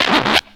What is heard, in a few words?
FX; AMG Megabass REMIX! VOL-2